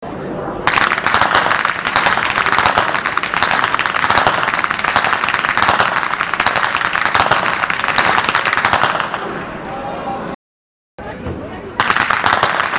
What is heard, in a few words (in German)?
schnalzen; jugend; feldkirchen